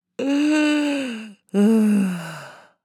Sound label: Woman yawn